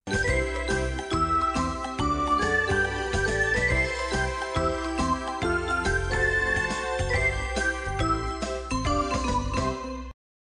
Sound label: bg music